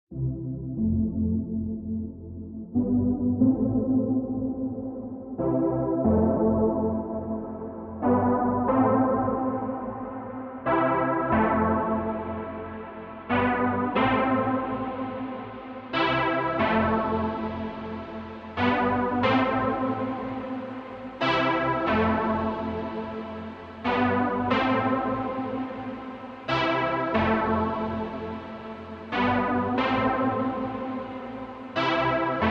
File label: Kick and Synth Chords Only Mix